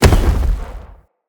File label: Blunt Heavy Impact Vegetation, Weapon Impacts; Player; Environment